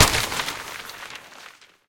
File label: Pinjata; peace death 2017 pc